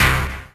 HOUSE, House Dance

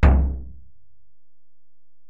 Ac BD, Alesis DM-PRO; 300 Drum Machines

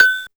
Agogo Casio SA-1

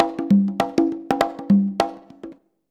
CONGA, Modern Percussion Loops

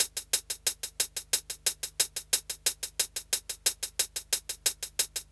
Hi Hat Loop

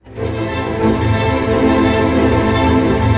ARP A, STR ARP MIN; Sound On Sound - Peter Siedleczek's Orchestra

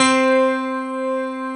DPF C3; AKAI S-Series CD-ROM Sound Library VOL-2

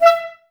wind clarinet, Clarinets, Wood Winds; Miroslav Vitous - Woodwind Brass Ensemble; 90's Sample CDs

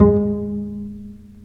STR Cb Pizzicato; Roland L-CD702; Cb Pizz 1

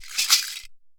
MARAKA SHAKE; Best Service; World Colours

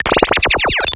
Laser Fast

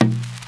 Tom Hand Drum; Lost Tapes From Mars

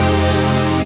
Fm Dub Chord

